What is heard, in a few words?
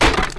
wood; monster